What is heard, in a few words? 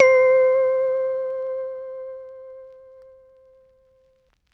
One Shot - C, Synth; Cymatics; Light Free Sample Pack; Bonus Samples